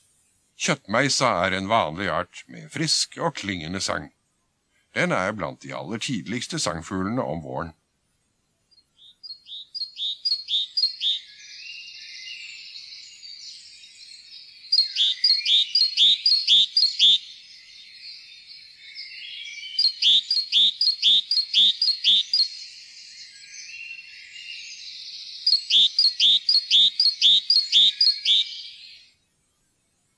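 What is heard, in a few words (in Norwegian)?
kjøttmeis, fuglelyder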